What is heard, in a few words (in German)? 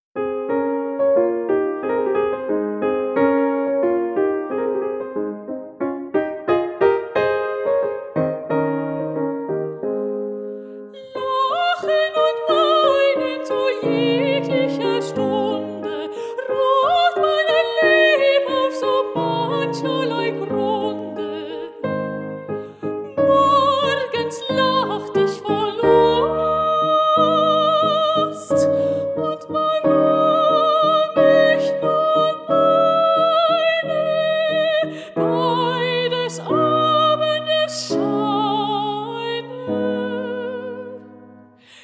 Lachen und Weinen